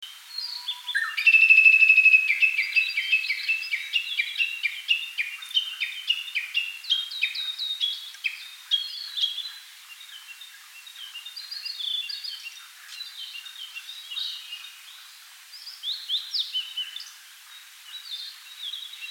Cettia diphone

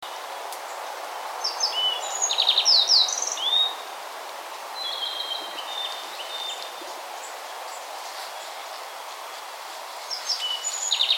Emberiza sulphurata

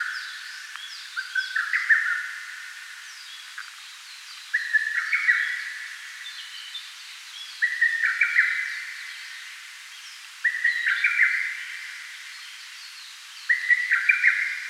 Cuculus poliocephalus

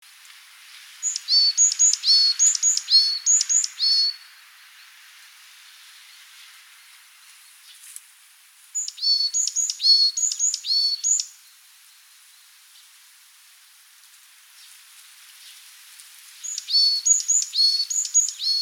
Parus varius

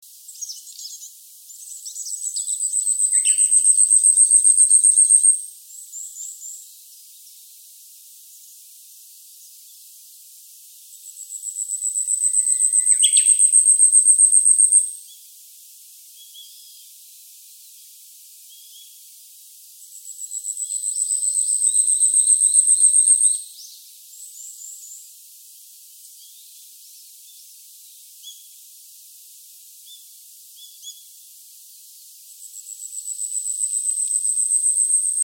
Urosphena squameiceps, Cettia diphone

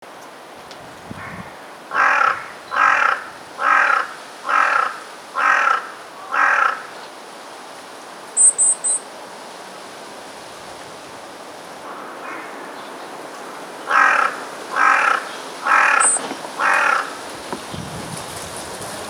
Corvus corone

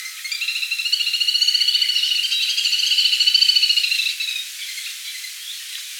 Tachybaptus ruficollis

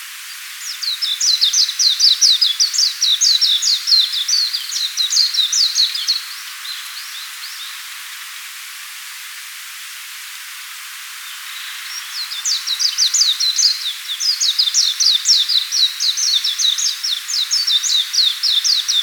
Zosterops japonicus

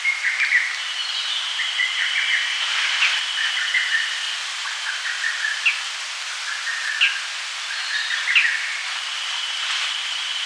Cuculus poliocephalus Lanius bucephalus

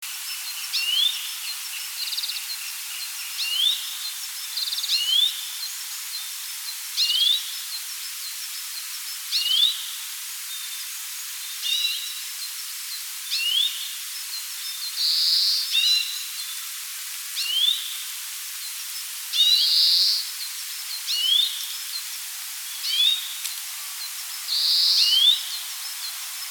Carduelis sinica

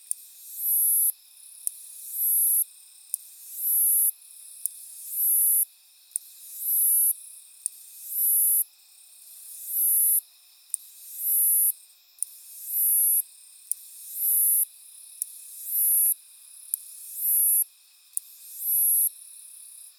Hexacentrus hareyamai

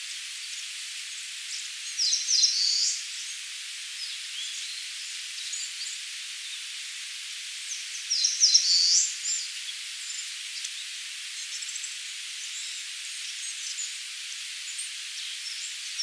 Phylloscopus coronatus

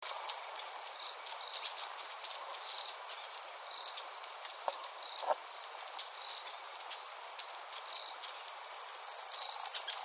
Aegithalos caudatus

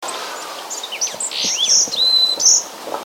Parus varius

